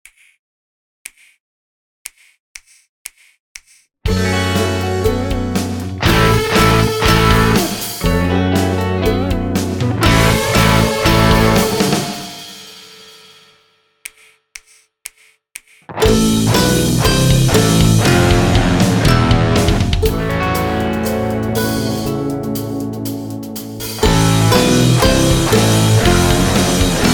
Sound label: Piano Forte